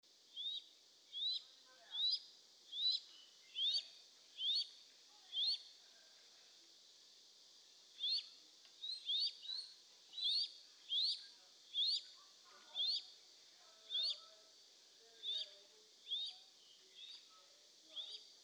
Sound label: Crested goshawk